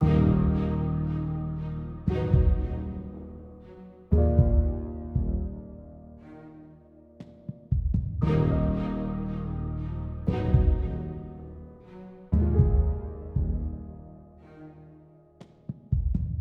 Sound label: Kaiju Calm